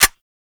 Sniper Low Clip, Weapons; DE; Warframe; Tenno; Quad Sniper

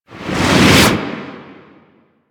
Environment Explosion; Proj C; Mission Four; Warframe; The New War

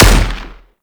Anti Aircraft Gun Shot A; Weapons; Warframe; Archwing

